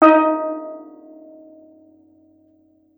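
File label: STEEL DRUM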